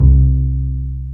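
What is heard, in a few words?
String, contrabass pizzicato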